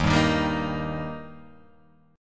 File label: Eb Major Eb; strummed; JGuitar